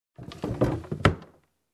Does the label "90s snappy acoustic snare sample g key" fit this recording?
No